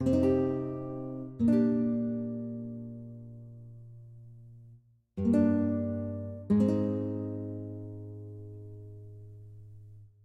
BGM Painful; aster tatariqus android ios mobile gamerip 2023; BGM ADV Noir Painful

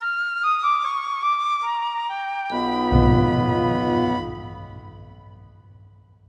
Bari Sax

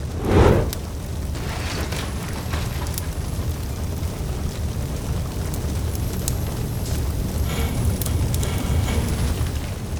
Car Fire

